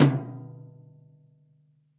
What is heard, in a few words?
Tom Brush Mid Club Sizzle; drum hits; Ableton; Factory Packs; Session Drums Club; Standard Hits